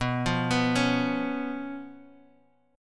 B Suspended 2nd Flat 5th down Guitar Half Step Down